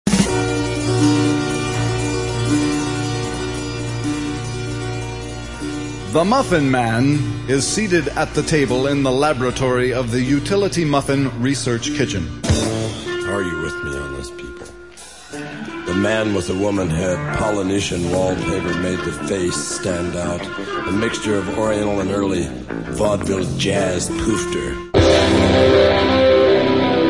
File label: bongo